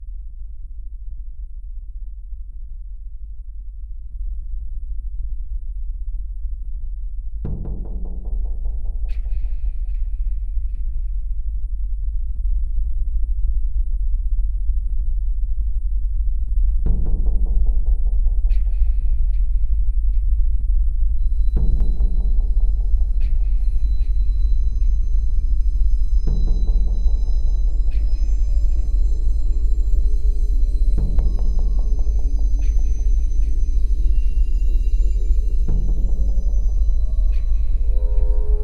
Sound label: Old Town Horror; Fear & Hunger 2: Termina